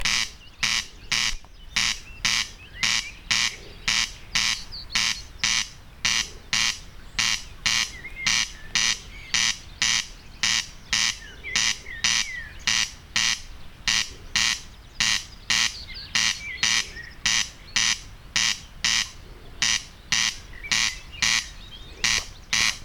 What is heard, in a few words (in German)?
Wachtelkoenig; Murnauer Moos; Christian Haass